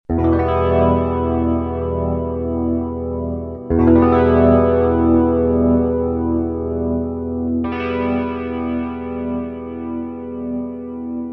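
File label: clean electric guitar cls222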